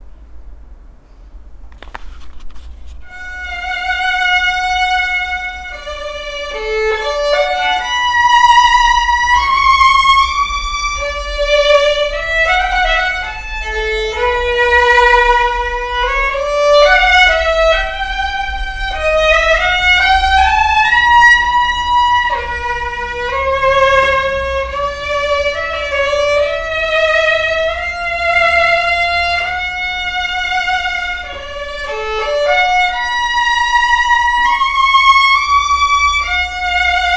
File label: violins Stradivari sound clip; Petko petkov